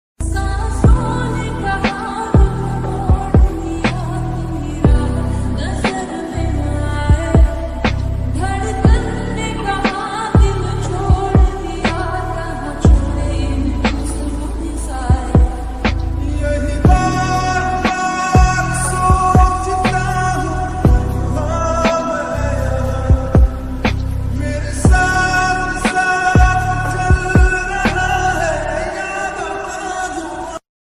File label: emotional ringtone broken heart ringtone sad ringtone